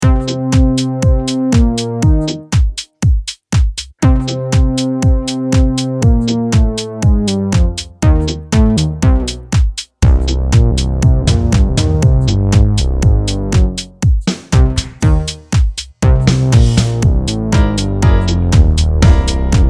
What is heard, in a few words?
Martian Blues Short